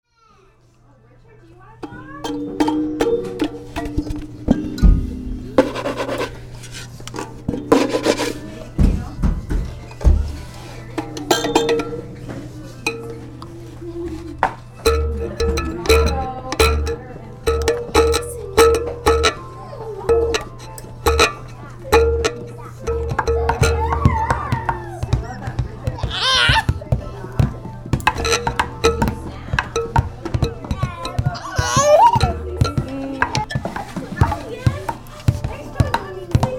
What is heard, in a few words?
Kalimba and Drums